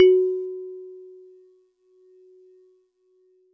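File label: Celeste DX100 F#1; Pristine Celeste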